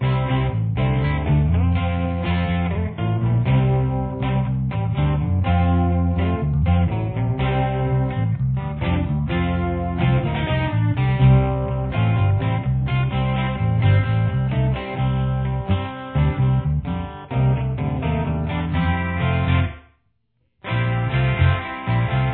chorus, guitar

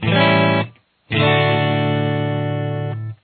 a dominant 7th resolution, guitar

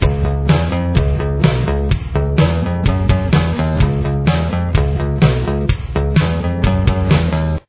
bass

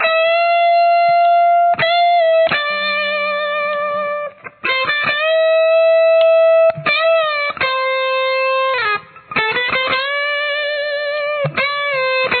intro lead guitar

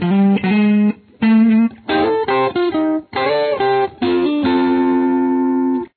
outro lead

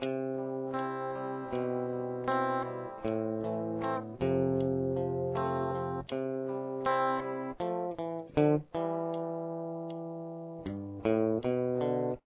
rhythm

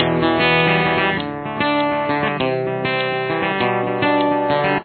pre-chorus, guitar

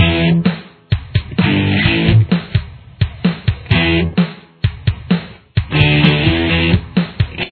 chorus, guitar

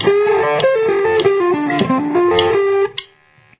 outro

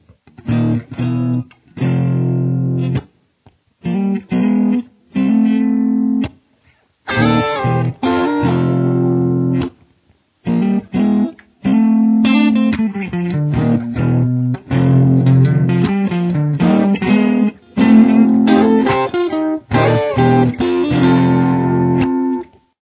outro

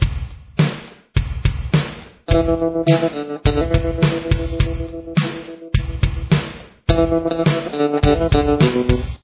verse 1 guitar

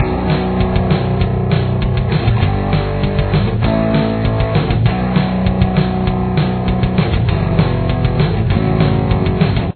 solo backing, guitar

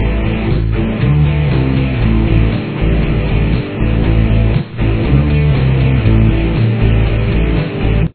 fullchorus, guitar

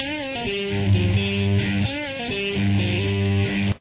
verse 2 1st guitar

